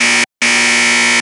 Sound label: siren; Yo-kai Watch Busters Akanekodan; ubaune siren2